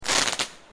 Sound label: fireworks spark; ambient; pvkii